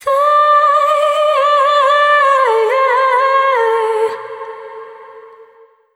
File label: Vocal Taiyaya